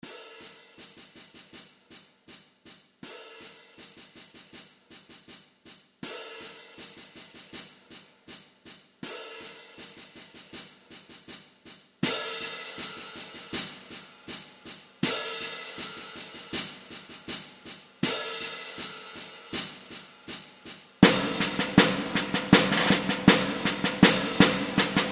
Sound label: DRUMS OF THUNDER